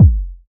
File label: kick sample g key